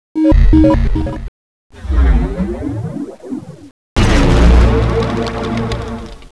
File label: crystal spawn; svencoop